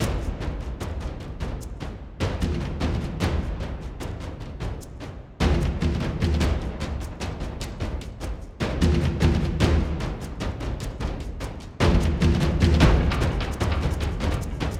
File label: Tribal; Warhammer 40,000 - Angels of Death